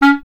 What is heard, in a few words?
clarinet C#, Solo Instruments; Miroslav Vitous - Solo Instruments VOL-1; 90 s Sample CDs